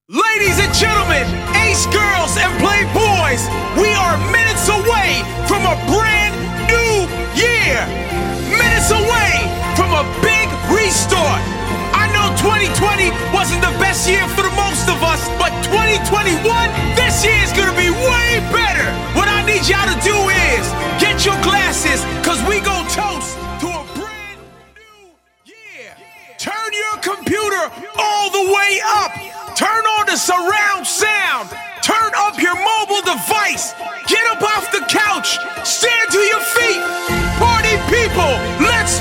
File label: NEW YEAR COUNTDOWN 121 BPM; DJ TOOL